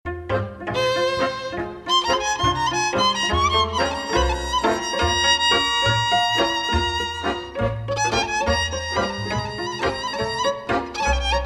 pur violin; Electronica

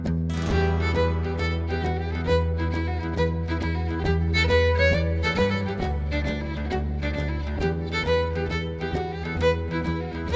celtic violin; Electronica

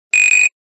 nokia buzzer

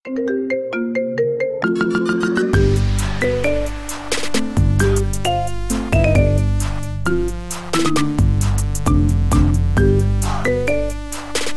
marimba remix; Pokemon

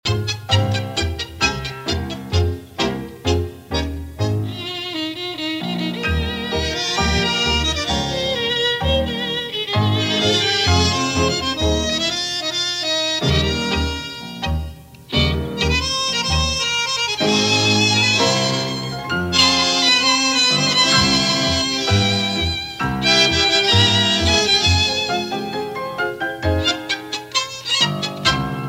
tango; Latin

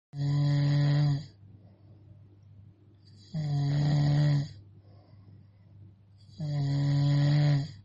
snore

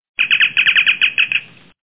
communicator chirps

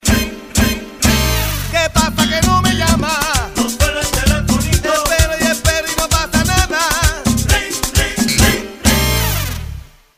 salsa; Latin

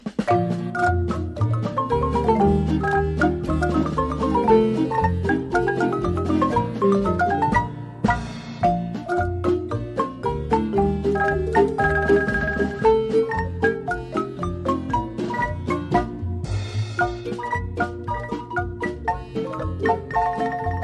marimba; Latin

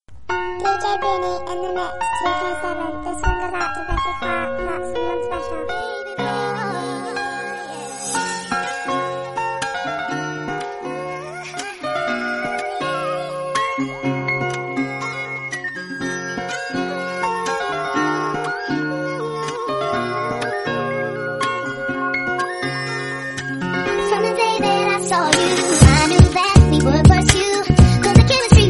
Baby pop

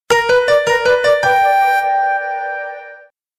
tango messenger

